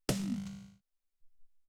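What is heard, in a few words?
Robot Snare, Alesis SR-18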